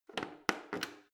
plastic button creak defect press

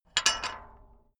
metal gate bolt

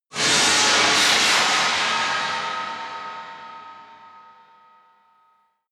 whoosh scifi lash

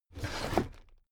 drawer cabinet crammed open heavy halfway

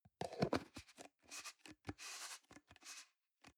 plastic can small lid screw close soft

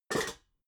pots stack soft

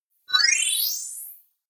appear sparkle short

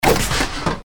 pneumatic blast

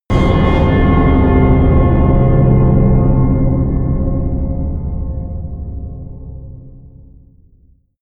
bell hit low

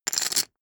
poker chip stack rattle

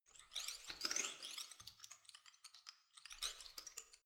organism short

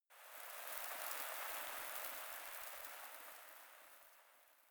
desert oneshot sand

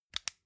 flashlight on fast

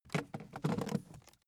aluminium case pick up

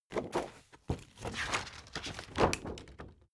spring binder open long

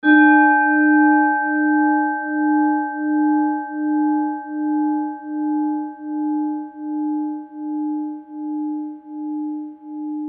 japan black racko bowls, D

